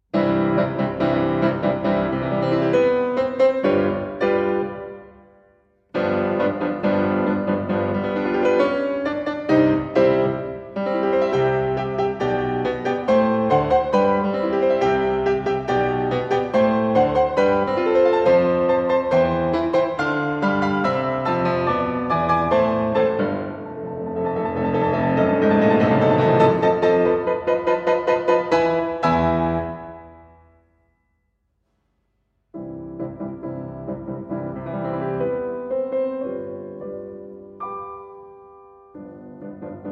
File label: Fantasie in C Major Allegro con fuoco ma non troppo; Op. 15, D. 760 Wanderer